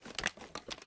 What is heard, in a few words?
reload empty fast xmag start; gmod; viper